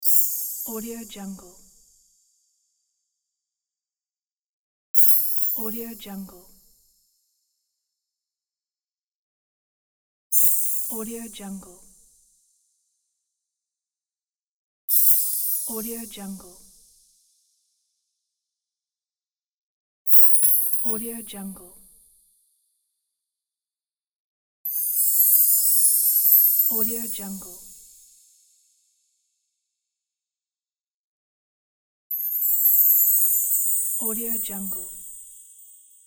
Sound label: bright magic chimes; gamechestaudio